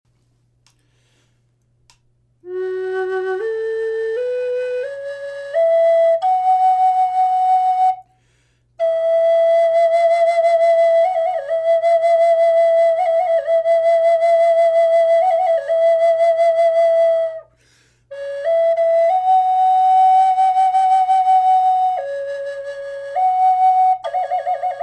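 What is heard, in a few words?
natural, flute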